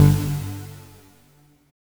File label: NOISY D2, dubstep; Jack Sparrow Deep Dubstep Progressions